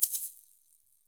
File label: PERC, Percussionworks; Sampleheads; NYC Percussionworks; 90 s Sample CDs